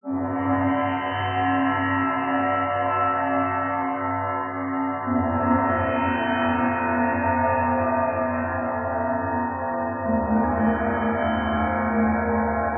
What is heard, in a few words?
harm gong